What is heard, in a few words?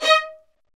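STR violins, Roland; L-CD702; Vlns Marc4-5; Vls Marc4 x8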